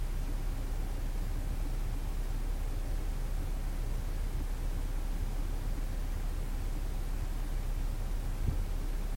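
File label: approaching noise of sea waves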